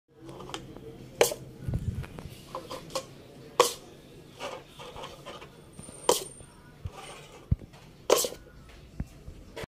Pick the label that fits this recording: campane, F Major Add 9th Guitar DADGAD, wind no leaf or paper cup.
paper cup